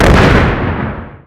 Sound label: Crate Pop; Worms3D